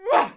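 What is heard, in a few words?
Cbmn Attack Vox; Civ4 warlords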